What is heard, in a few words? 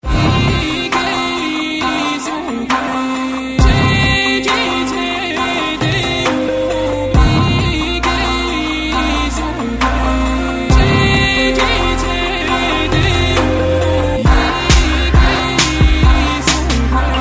Bass Music